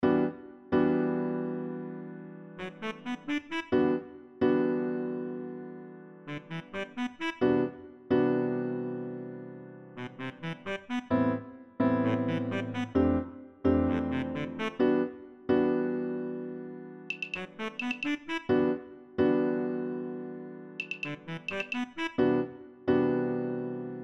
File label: Tango; MIDI